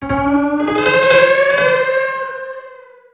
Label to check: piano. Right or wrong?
Right